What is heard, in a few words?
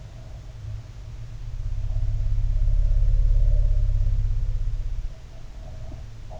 elephant rumble; animals; disneyhand; environmentality; trackingcenter